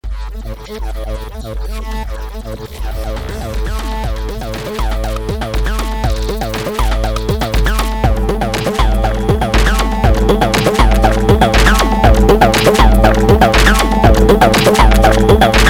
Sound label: Unmix Drums, Drums; Eine weniger dichte Erkennung führt zu vintagemäßiger Rauheit und kann für spezielle Lo-Fi-Effekte verwendet werden; Zynaptiq